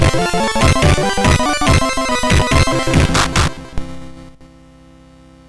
MOS6581R2, sid

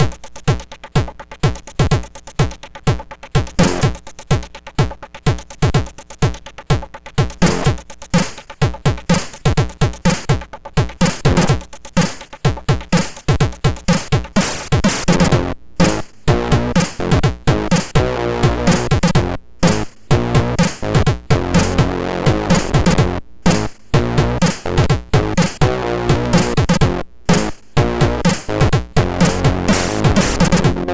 Heavy Introtune, MOS6581R2

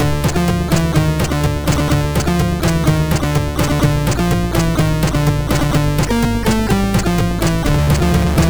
Intro sid MOS6581R2; Las Alex; Alan Group Intro

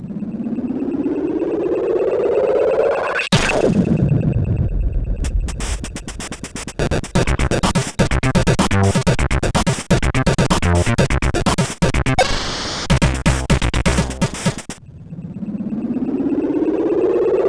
Intro, CSG8580R5; Goto80

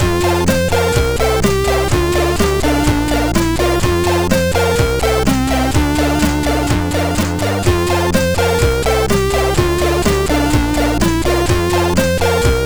sid MOS6581R4

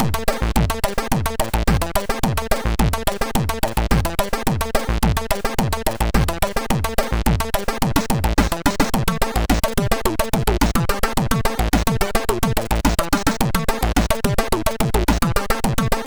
Funk Music, MOS6581R3

